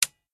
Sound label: click